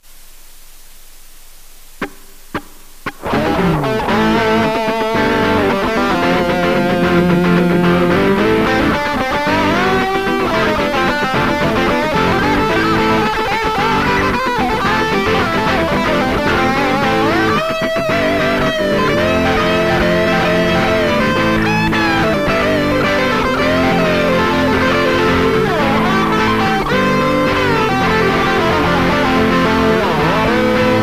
Gibson

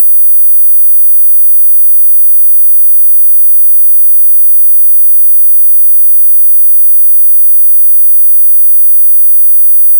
GENERATOR 19000Hz

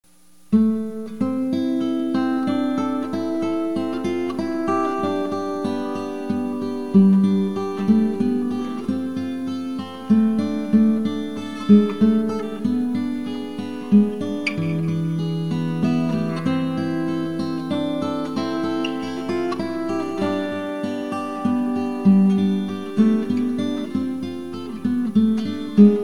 green sleaves guitar

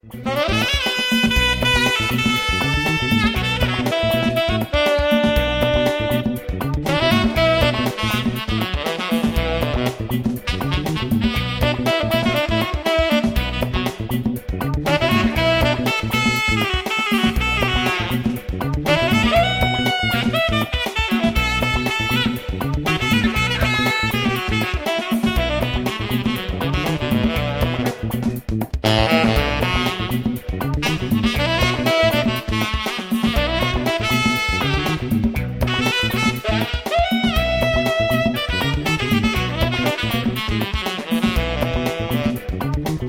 Funk

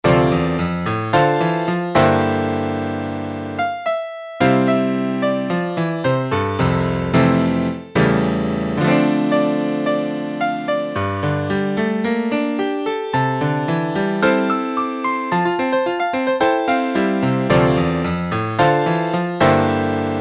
piano night

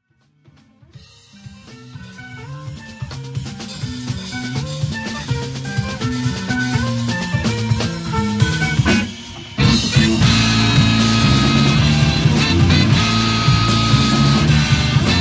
9 beats

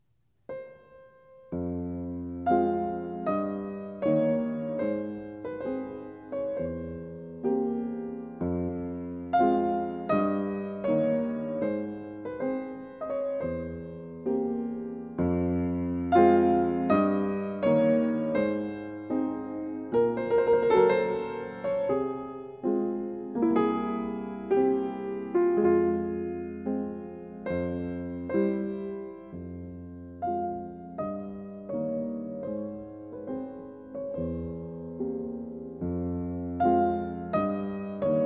Chopin Nocturne No15 f moll

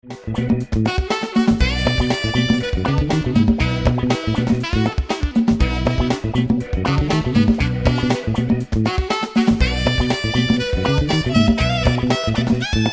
Funk Alto Level Air

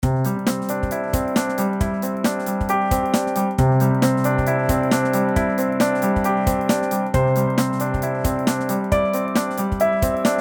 piano

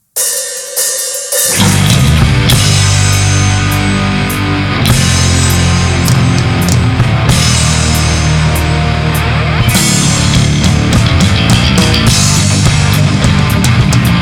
karaoke; HyruleFLOOR; Zelda

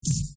sword high

